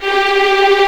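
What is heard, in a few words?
G4 tremolo f violin right, violins tremolo; East West; The Ultimate String Collection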